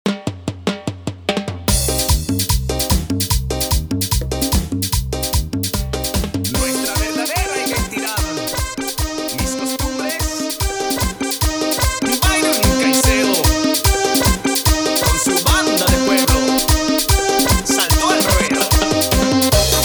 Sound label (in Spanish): Acapella Instrumental, 148BPM; Proyecto Acapella